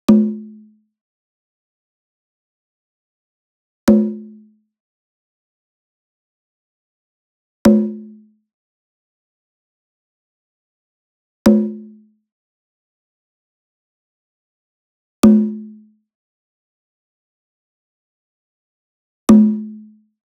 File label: SMALL DRUM Tambora Hit Hard Beater Near; boom library; Cinematic Strikes Construction Kit